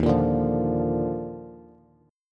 Eb Major 7th Suspended 2nd, Bass; strummed; chord; JGuitar

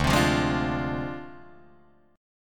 D Minor 7th Flat 5th Guitar Drop D

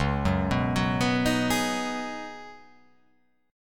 Db 7th Suspended 2nd Suspended 4th; JGuitar

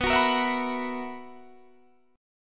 B Major 7th Suspended 4th B

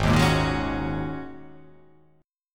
G sharp 9th Suspended 4th G sharp

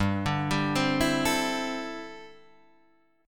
D 7th Suspended 2nd Suspended 4th, G

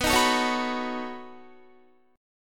B 9th B down Guitar; strummed

